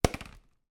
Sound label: bicycle helmet down on ground; transport